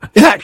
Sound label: engineer pain severe